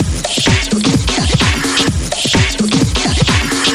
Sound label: House Beat, 128 BPM